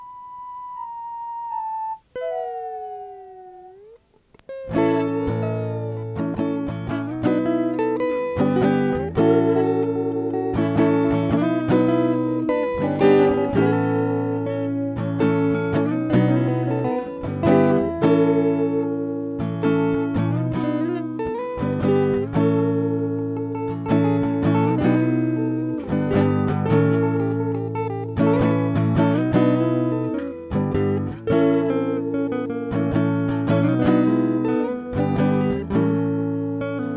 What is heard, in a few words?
guitar piece